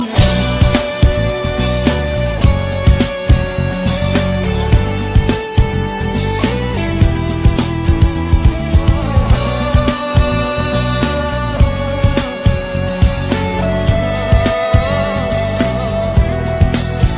Bhool Na Piano; Don2

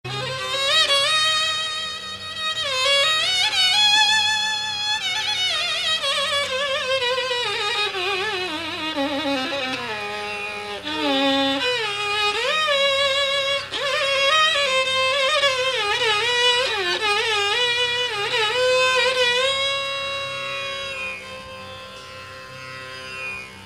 Sad Violin

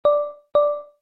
Ding Ding

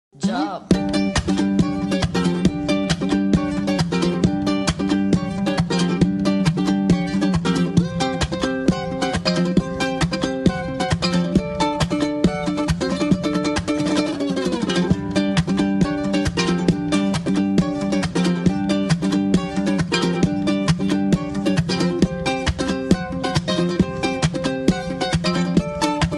ukelele

